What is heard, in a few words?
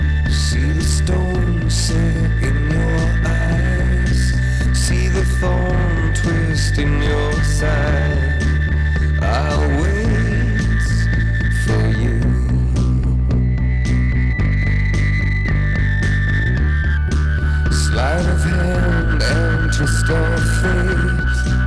samples -12db clipped short; professional audio de-clipper; myaudiofactory